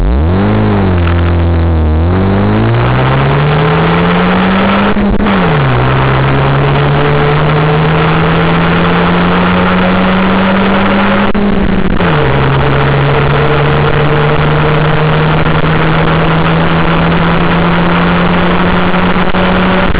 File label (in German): von 0 auf 120; astra